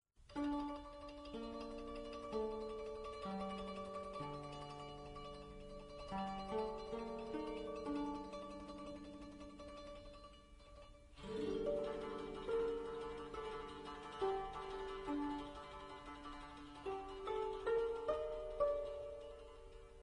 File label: japan bgm